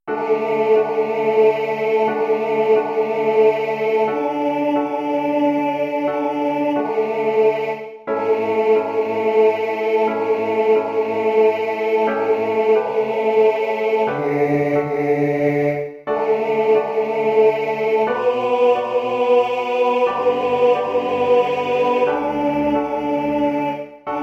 we are building tenor

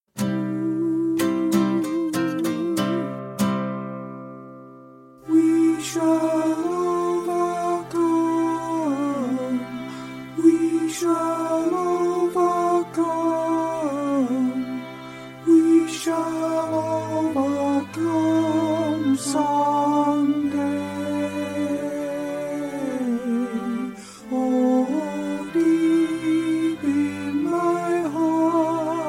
alto sung

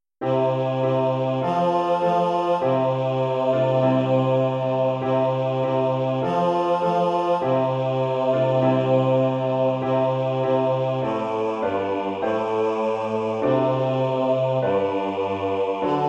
bass

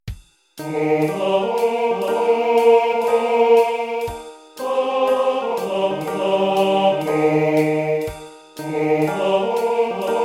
swing tenor

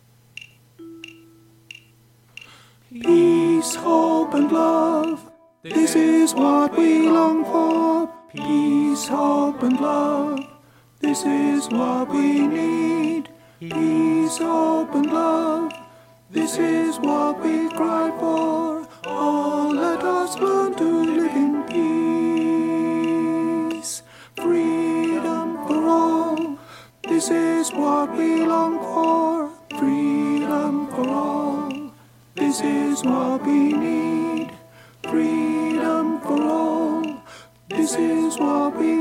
alto sung

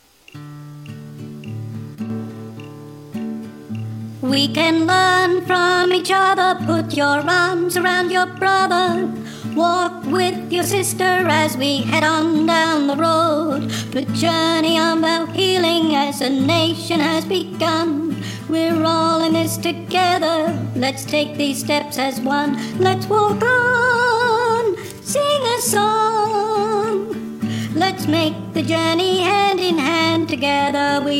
alto at pitch

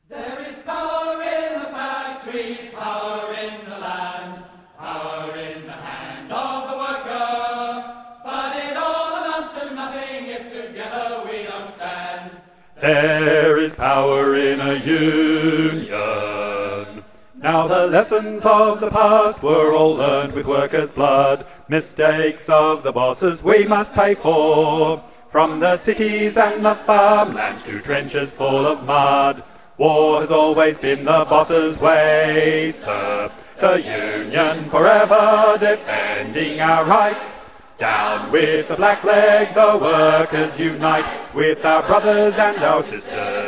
bass

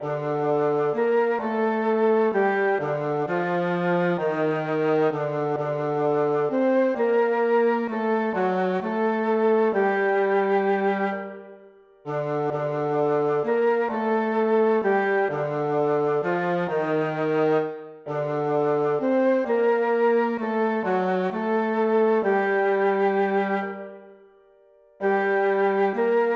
Gm all winds

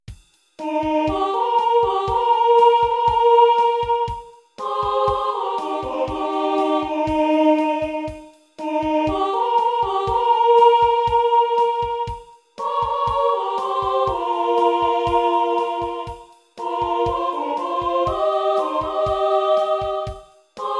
short simple all parts

